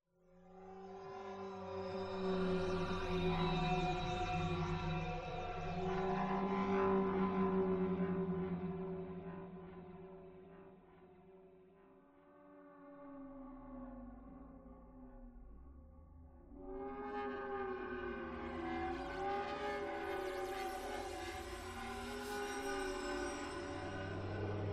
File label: Chill Ambience